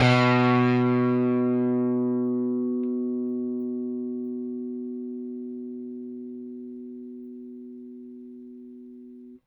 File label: Rickenbacker Bass; Airwindows